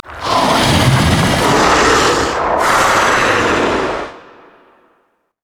spider idle; LoL

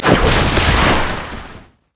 inferno bomb; LoL; ziggs snowday

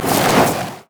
zombie basic attack; LoL; brand zombie

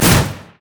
shadow swing; LoL; zed stormninja

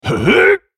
spellcast effort

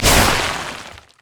anchorchain pull; nautilus

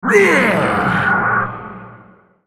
ultimate effort; Nautilus; Character Voices - English

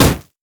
mech melee basic attack; blue; minions

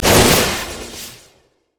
electrical surge bolt; LoL; kennen

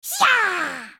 spellcast effort; Kennen; Character Voices - English

